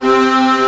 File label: STR C6 FF L, STRNGS, Orchestra; Sound On Sound - Peter Siedleczek's Orchestra